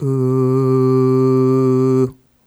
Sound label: Ooh Short C2 Dry; Harmonic Building Blocks